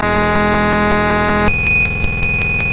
noisy

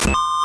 weird

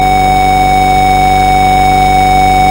buzz

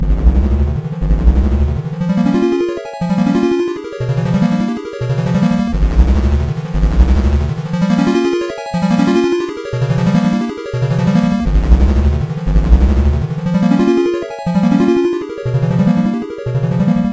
pulse

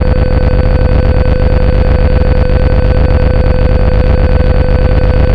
buzz

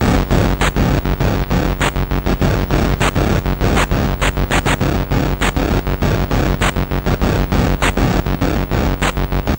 steady

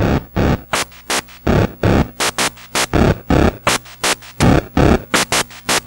slow

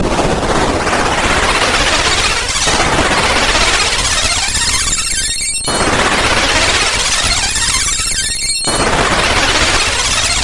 psycho bird loop

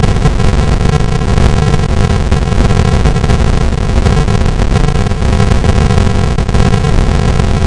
deep

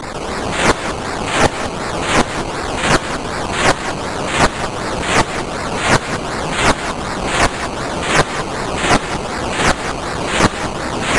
swoop

